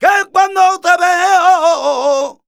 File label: c#, Flamenco Vocals Male Cantaor; Best Service ProSamples vol.46